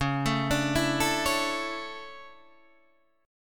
D Major 7th Suspended 2nd

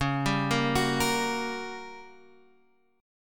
G Minor Major 7th Flat 5th, C sharp

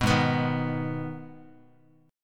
G sharp Minor G sharp down Guitar, chord; G#m strummed; JGuitar

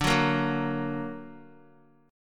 D Augmented D down Guitar; D+ strummed; JGuitar

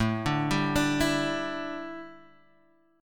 A 7th Suspended 4th Guitar Half Step Down, chord

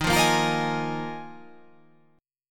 D sharp Suspended 2nd Suspended 4th D sharp

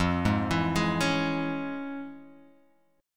F Minor Major 7th Sharp 5th Guitar Open G; 3,1,2,1,2,x

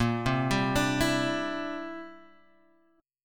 C Major Add 9th, Bb, down, Guitar, Standard; arpeggiated; Cadd9

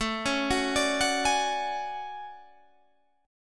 D-Major Sharp 11th, A

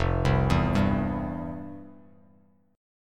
F Major 7th Suspended 2nd, F, down, guitar; arpeggiated; JGuitar

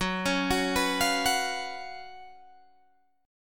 F sharp Major 7th Suspended 4th, Guitar, Open G

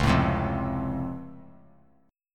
Db Augmented Major 7th, down; strummed; JGuitar

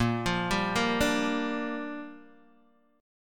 A sharp 6th, Guitar Open D

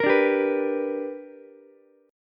B Minor Major 7th Sharp 5th B, Ukulele; strummed; JGuitar

